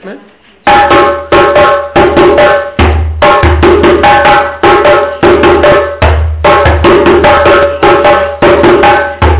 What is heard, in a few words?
Ritm; Djansa